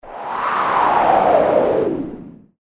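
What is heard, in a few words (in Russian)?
сдувает; Звук ветра; Звук ветра для перехода в видео или для монтажного перехода в аудио монтаже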